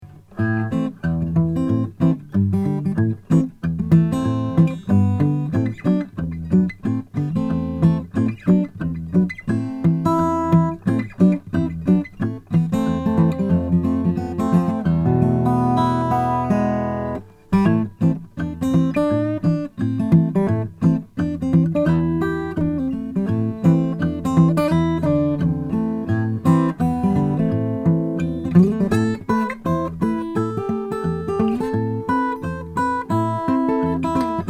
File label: Leach custom tiger guitar figured